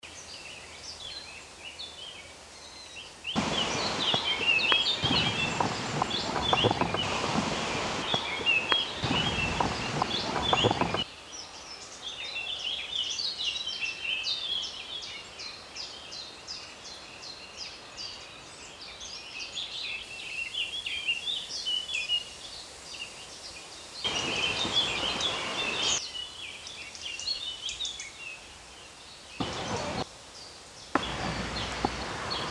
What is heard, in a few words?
Diurnal Sound Art Installation